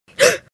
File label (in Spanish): susto mujer